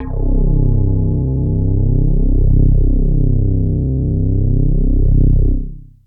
Big Synth Basses

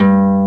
HARP KARPLUS

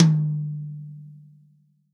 REAL DRUMS POP KIT

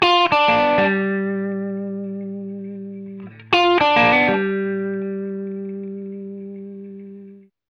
8TH MEL V -R, Hot Steel Blues; ILIO; 138 8TH SHFL

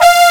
Racing Game Robot; Ganbare Goemon 4 - Kirakira Douchuu

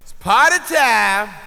VOX GENERAL; AMG Megabass REMIX! VOL-2

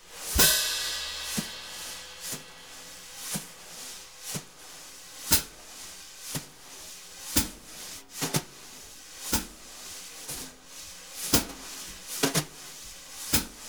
BRU, BRUSH; Univers Sons; Jazzistic

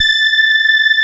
STRIA, Twisted Textures; STRYNGI

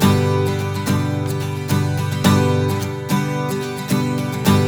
Blues Guitar Samples; ILIO; Fretworks; 108 NATL RIFFS

